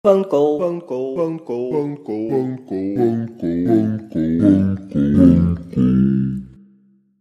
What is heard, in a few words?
phoncall